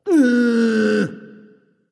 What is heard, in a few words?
tounge; smite; voicepack; osiris